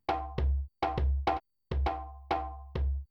Djimbe Drum

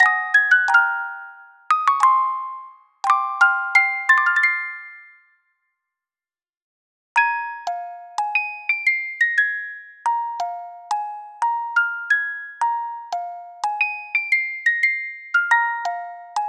Fusion (Music Box)

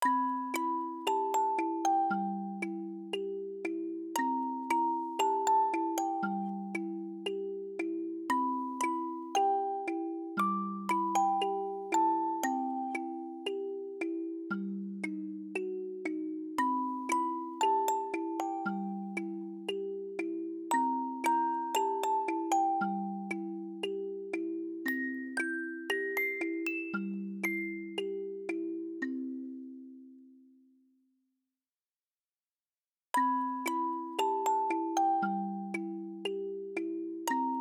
Hop, Music Box

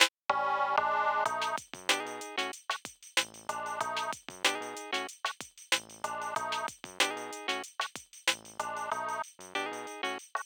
Groove, Aircheck, Cheap

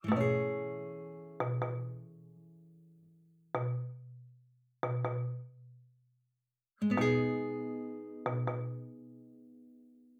Rainy

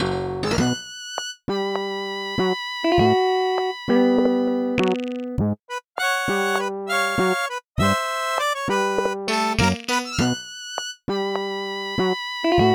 Milonga Aircheck Hi-Fi

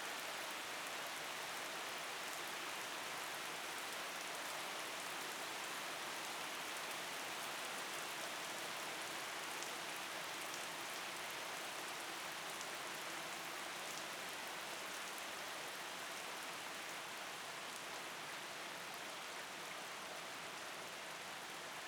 Rain Soundscape

